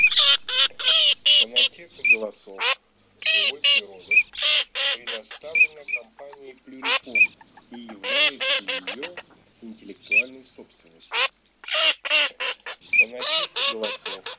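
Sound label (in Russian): Чирок-свистунок Alzavola, манок; plurifon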